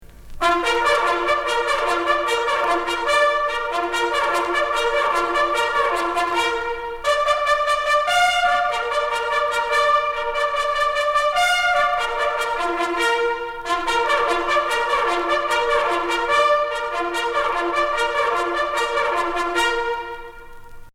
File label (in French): Le réveil (clairon), Sonneries règlementaires en fanfare